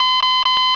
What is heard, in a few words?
beep; batcave